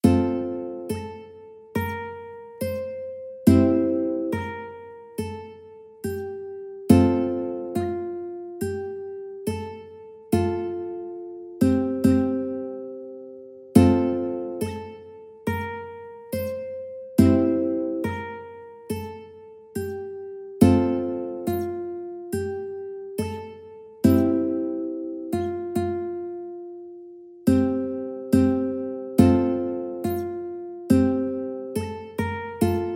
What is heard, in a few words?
ode to joy ukulele